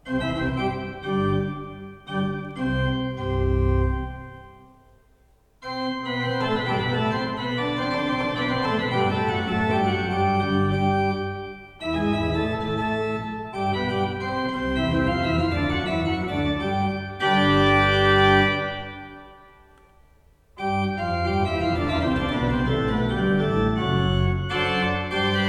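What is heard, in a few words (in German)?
Prengel 14 II P, pfeifenorgeln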